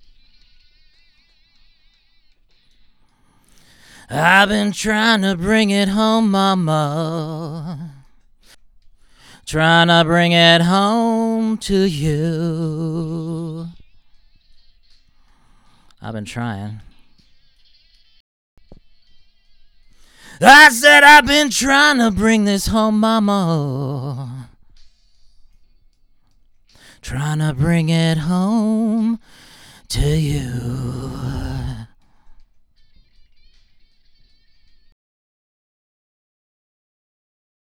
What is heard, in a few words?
vocal; Pro Tools Blues